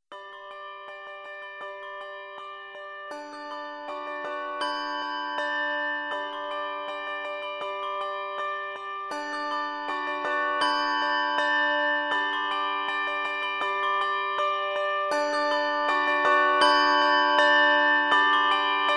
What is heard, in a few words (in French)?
carillons de noel